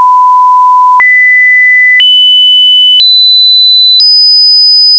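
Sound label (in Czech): sinusovky, sine waves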